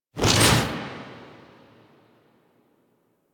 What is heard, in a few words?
Scythe Pull; Stalker; Warframe; Quests; The New War; Sealab; Hunhows Lair; Hunhows Lair Part One